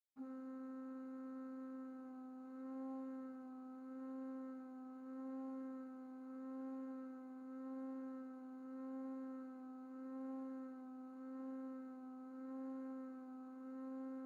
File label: Flute trem, organ; organteq2